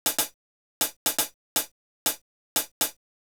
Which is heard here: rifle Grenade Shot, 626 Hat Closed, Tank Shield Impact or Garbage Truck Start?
626 Hat Closed